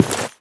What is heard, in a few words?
player tile